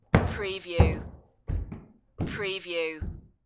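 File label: Footsteps Wood Stage Stairs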